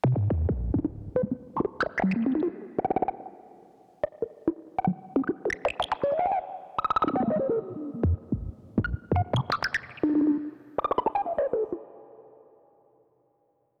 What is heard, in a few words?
modular squiggles; Polyend